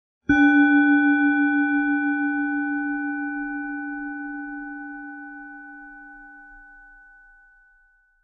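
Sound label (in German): Planetenschale Mars mit Klöppel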